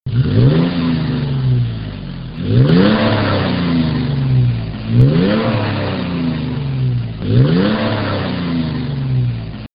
Tacoma Xrunner 4.0L Single

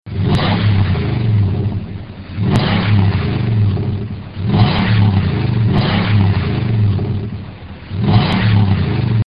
Titan 5.6L Single

